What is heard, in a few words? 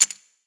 chip multiple, roulette; frenchroulette3